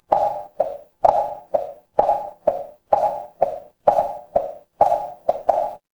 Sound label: footsteps heels